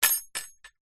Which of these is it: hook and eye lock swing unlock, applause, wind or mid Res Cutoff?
hook and eye lock swing unlock